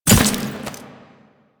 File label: Machete Finisher Stab Small; Sentient; Warframe; Quests; The New War; Kahl; Weapons